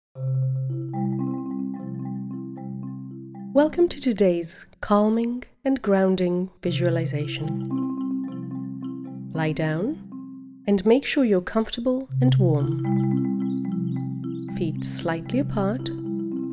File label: forest